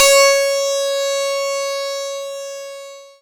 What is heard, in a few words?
C#, Synclav FM PLUCKED; Big Fish Audio